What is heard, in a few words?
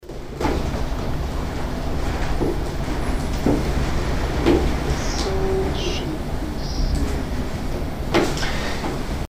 EVP; Soul EVP Only Boost 10db